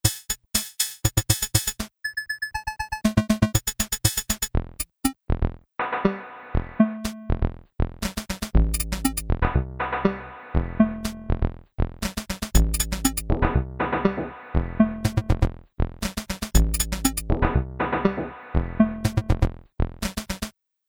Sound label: drummer